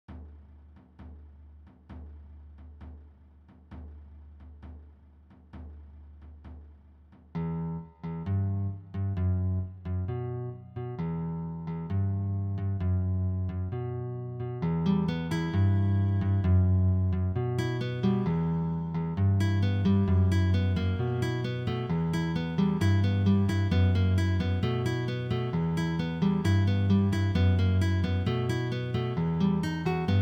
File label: Batuque B-A-C-H